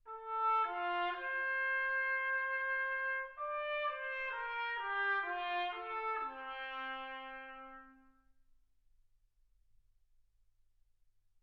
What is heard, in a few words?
trumpet